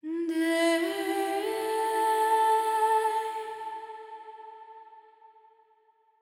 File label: Mermaid Song